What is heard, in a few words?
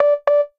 warning